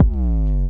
BD Sub

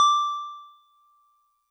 DigiPianet DX100 D7

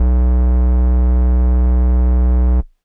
Air Bass Kawaii B0; keys

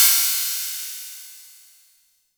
Cymbal

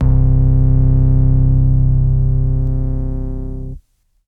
Lead2 EP Vibes Vinyl Synths C0; vinyl keys

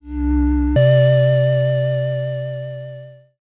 SYNTH Double Ding

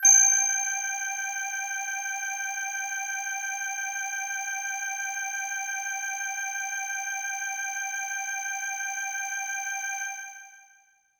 Bell Air Kawaii G-1

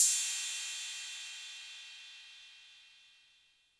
Cym A 808 Tape Decay C; Cymbal; Clean

